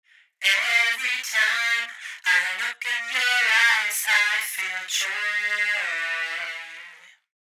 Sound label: Vocals Edge Parallel